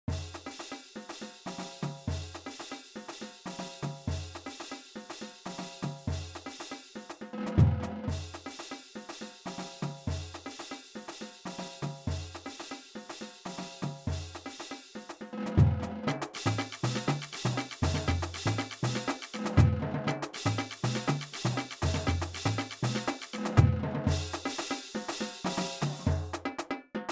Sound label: click